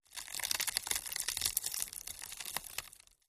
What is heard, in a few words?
knife twist chest, stabbing